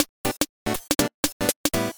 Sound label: bgm remix faster; Grumpy Cat's Worst Game Ever; Lucky Kat Studios; android